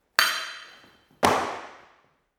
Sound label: sword hit sword tackle